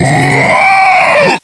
Attack; KF Christmas; Elf

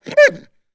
dog hit; animals

